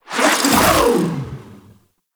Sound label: Grapple Travel; Warframe; The New War; Archon; Nira; Abilities